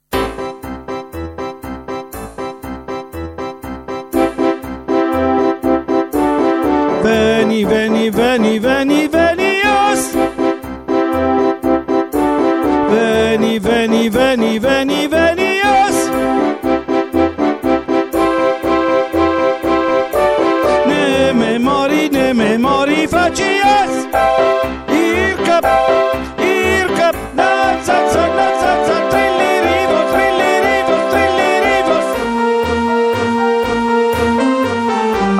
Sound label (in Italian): tenori coro; Carmina